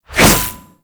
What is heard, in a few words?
Briefcase Scythe Whoosh; Warframe; Weapons; Corpus